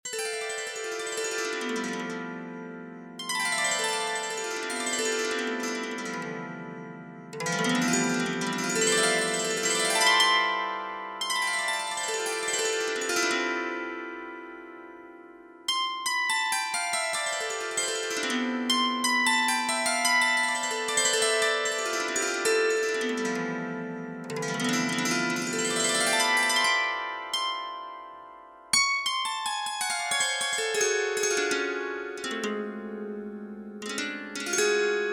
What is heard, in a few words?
swarmandal